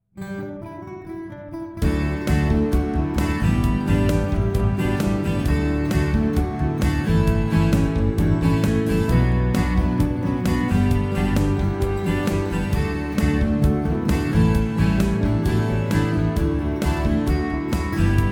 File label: intro w bass, Guitar